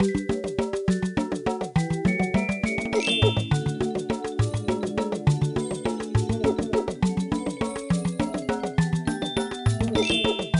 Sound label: Water Game Fast